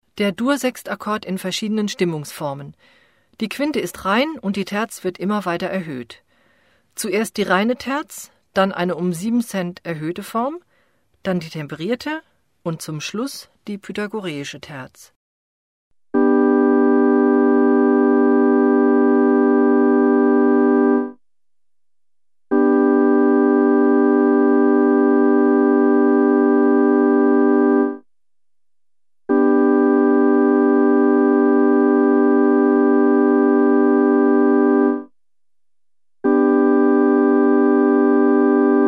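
major chords with changing size of the third in the bass voice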